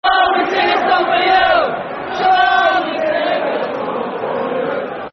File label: Shall We Sing A Song For You, Football Chant (Soccer Song); Sunderland; Sunderland Safc